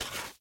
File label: reel Bounce; lost relics